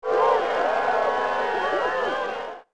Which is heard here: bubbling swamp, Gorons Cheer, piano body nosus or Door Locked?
Gorons Cheer